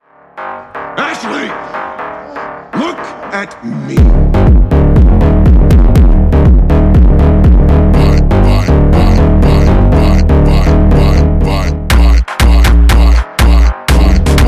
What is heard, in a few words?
MURDER FUNK beat